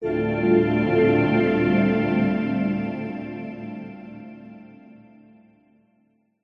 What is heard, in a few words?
multimedia logo musical warm swell